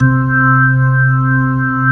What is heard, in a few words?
ORG, Organs; Sound & Vision; Gigapack I CD 2; ORG B-3 Organs